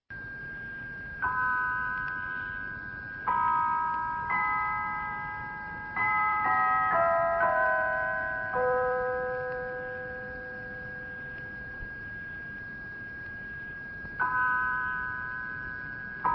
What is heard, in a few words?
837 kHz MW; Tanzania; National Service; Radio Tanzania